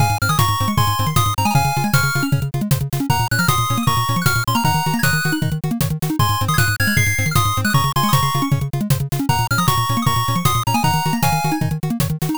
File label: bgm drive